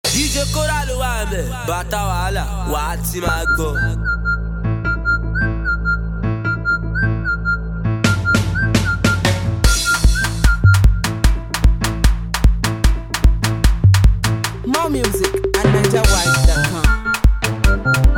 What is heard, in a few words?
Street Banger Free Beat